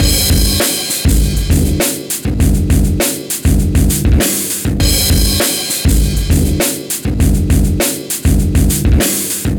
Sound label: 100 live breaks